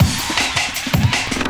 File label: Drum & Bass, 160 BPM